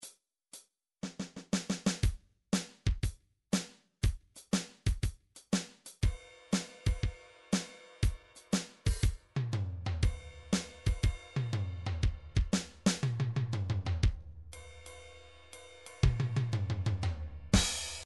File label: midi drums swing